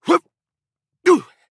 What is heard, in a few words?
Grunt Jump; Warrior; Lord Of Darkness; Realm Royale